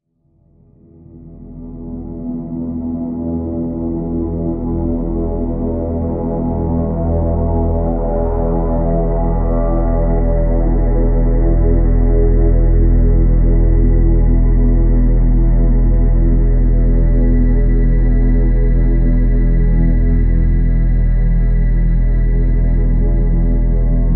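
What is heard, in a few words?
drone E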